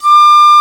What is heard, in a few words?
Piccolo mf D4; Digital Sound Factory Virtuoso